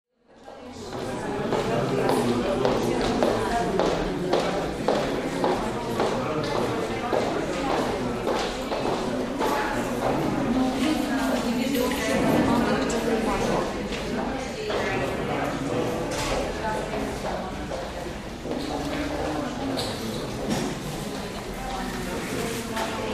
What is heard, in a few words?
Tax Office Amb Footste; lobbys & halls